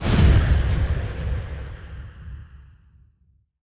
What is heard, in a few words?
Frenzied Explosion; battle suit aces